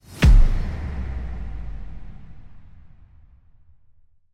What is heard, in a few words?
Epic Hit; soundscrate